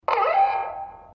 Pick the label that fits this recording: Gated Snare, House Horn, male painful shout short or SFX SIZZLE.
House Horn